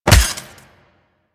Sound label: Melee Fist Impact Robotic Flesh